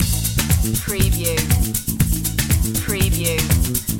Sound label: techno samba loop